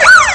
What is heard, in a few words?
siren; gmod; perp2.5